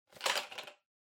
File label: Megaphone Hardware Chain Noise